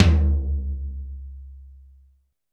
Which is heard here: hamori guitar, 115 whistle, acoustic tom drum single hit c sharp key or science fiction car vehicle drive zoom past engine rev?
acoustic tom drum single hit c sharp key